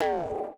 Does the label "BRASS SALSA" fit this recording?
No